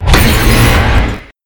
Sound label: hit dark